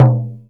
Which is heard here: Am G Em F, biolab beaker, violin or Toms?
Toms